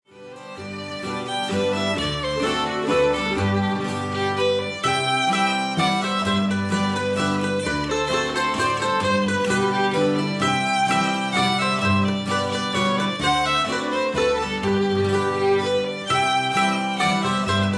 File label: contradanza